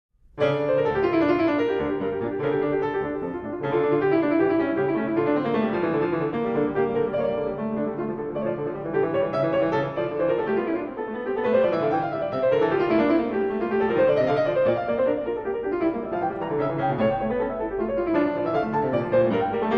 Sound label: Prelude And Fugue No 6 In D Minor BWV 875 Praeludium, Clavier; Johann Sebastian Bach; Well-Tempered Clavier